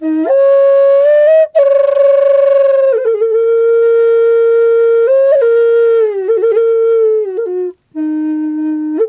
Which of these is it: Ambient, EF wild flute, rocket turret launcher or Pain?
EF wild flute